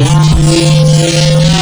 wavetable alien; Synthetic's Serum Collection; Audeka Wavetables

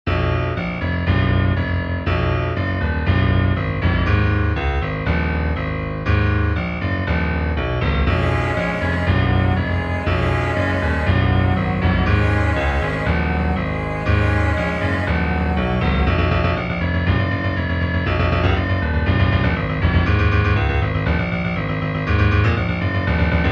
Drumless Instrumental; lolicore; Halloween Demo '19

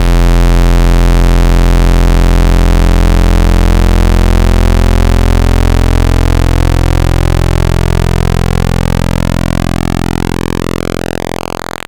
Basics PWM Pulse; Synthetic's Serum Collection; Echo Sound Works Core Tables

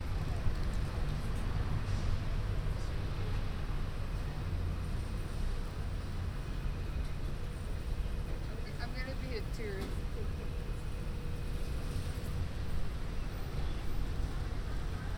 Somewhat Quiet City Soundscape; San Francisco 6.22.2017

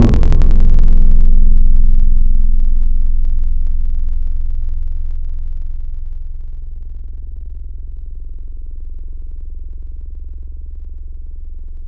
Knock, Tropical Mallets; Synthetic's Serum Collection; SparkPackers

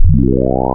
FFT Add 3rds; Digital; Synthetic's Serum Collection

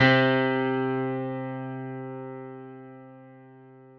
Piano C3; Synthetic's Serum Collection

